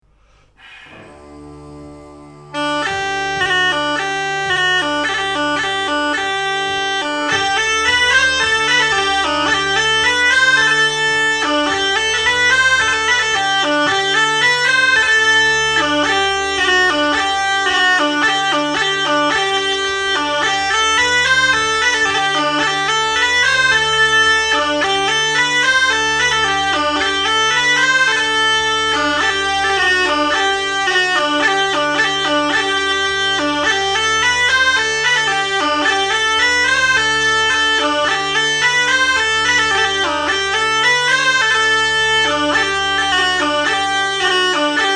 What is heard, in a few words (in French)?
Branle Instr Sol; Diable ton fourbi